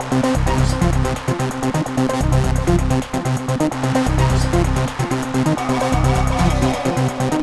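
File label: core 129 C minor, hardcore breakbeat; Big Fish Audio; Hardcore Breakbeat Action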